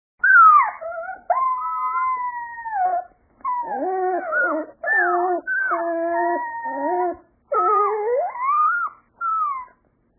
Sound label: Puppy Howls, wolves